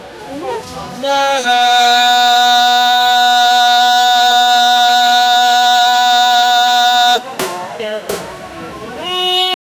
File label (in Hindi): bandar ka rone ka aawaz, monkey